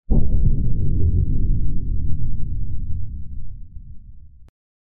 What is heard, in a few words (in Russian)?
vzryv moshhnyj podvodnyj